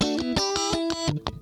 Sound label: Funk Guitar; 90 s Sample CDs; Zero G